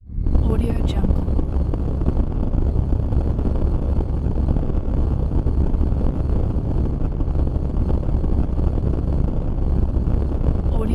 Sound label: scifi flame; soundcreator